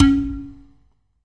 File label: Big pot BM, Clay Pots, ethnic and world percussion